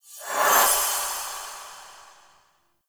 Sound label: Ward Cast Flock Accent; Powers; Fey; Founders